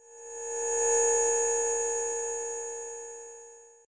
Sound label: ufo; Doodle Jump; 3DS, Android, Arcade, DS, iOS, Mobile, Xbox 360